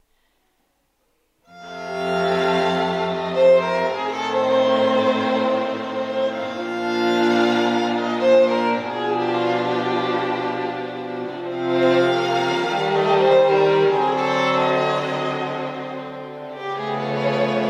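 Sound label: strings, String Ensemble; classical musicians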